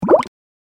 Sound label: bubble